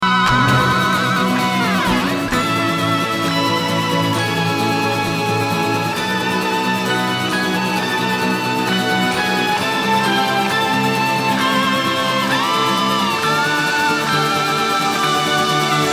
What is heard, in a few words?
12 Strings